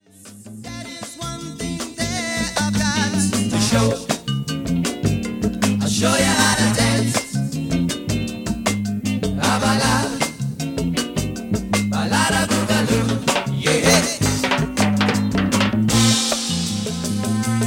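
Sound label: trombones trumpet; tbns/trpt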